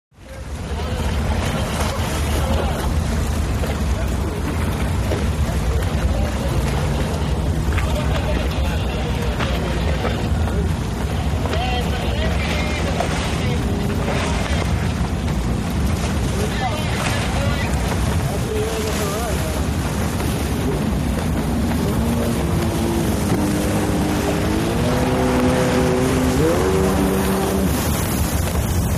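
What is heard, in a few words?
Power Boat Ambience, off shore power boat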